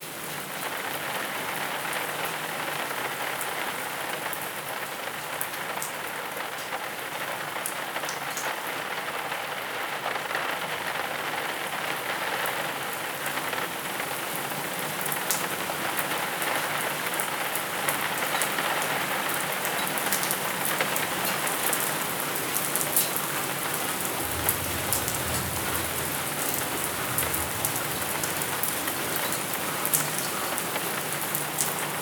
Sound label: Calm Rain on Rooftop